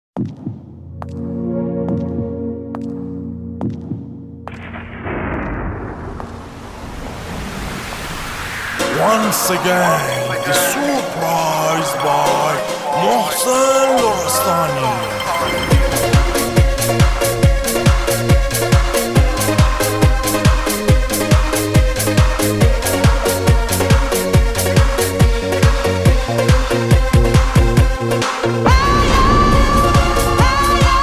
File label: remix